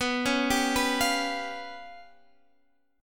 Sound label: C sharp 7th Flat 5th B